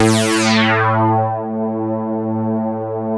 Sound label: LEADS; Masterbits; Vintage Voltage